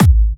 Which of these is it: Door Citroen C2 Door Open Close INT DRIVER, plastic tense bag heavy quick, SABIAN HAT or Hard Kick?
Hard Kick